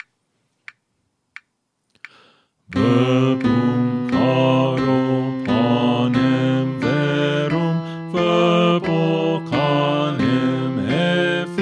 Bass; Pange, Lingua, Gloriosi; St. Columba Choir Practice Tracks

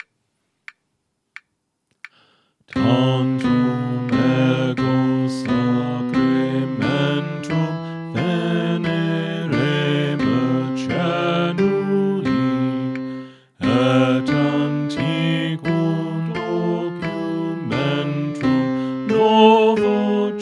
Alto; Pange, Lingua, Gloriosi; St. Columba Choir Practice Tracks

